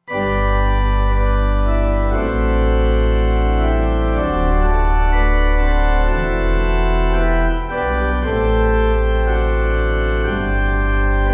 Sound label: Pipe, Pipe Organ; Barton